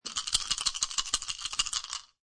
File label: Dice Shake Dice In Hand; Casinos